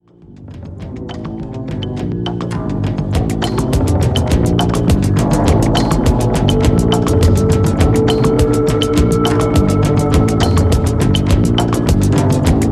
percussive

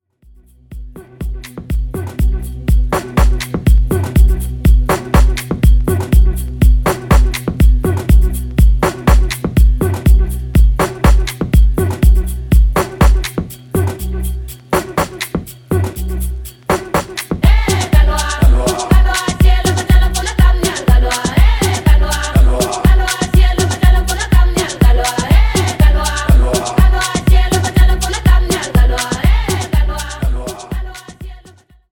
beat version

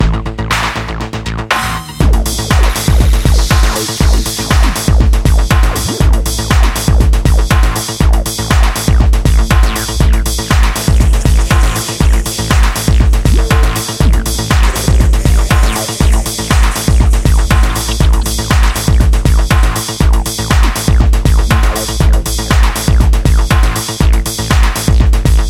dub

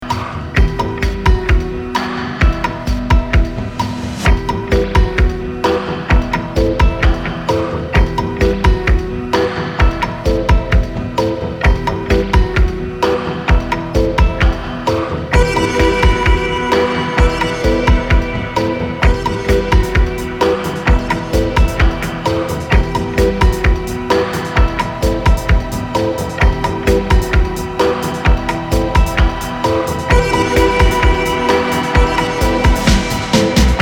disco dub

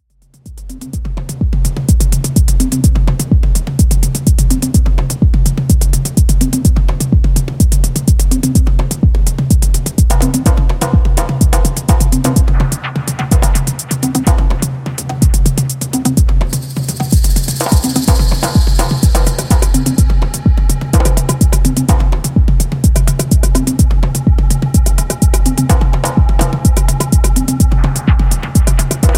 drums

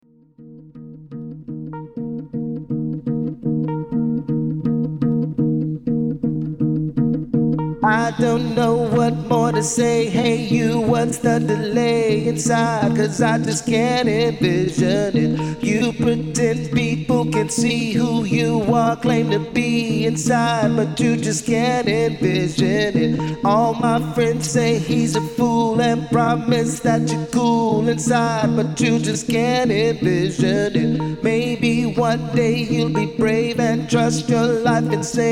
acoustic version

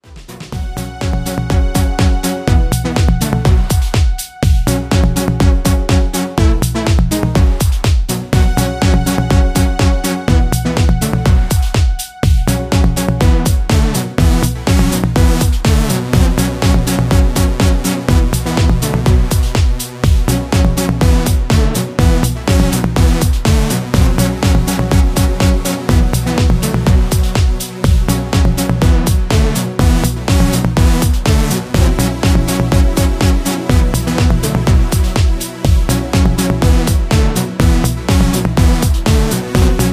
dub

